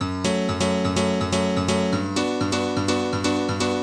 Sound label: House Piano (125BPM), retro house